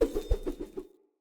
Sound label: Throwing Knives Projectile